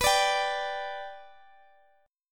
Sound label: B-Minor Sharp 5th, Guitar; strummed; JGuitar